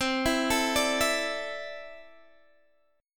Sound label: C 6th Add 9th, C, down, Guitar, Open G